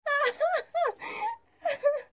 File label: laugh